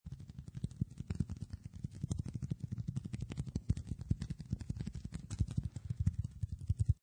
Critter, Tiny critter crawling sounds, tiny small foot steps; Insects; Insects (Sound Effects:Animals)